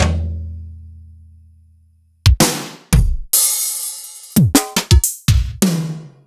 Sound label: Yamaha RY20, Samples Sliced; m8